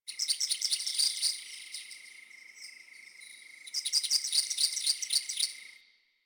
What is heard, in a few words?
Night Insect Generic; Ambience; One Offs; Insects; Warframe; Quests; The New War; Drifter Camp